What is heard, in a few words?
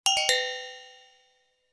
UI Quirky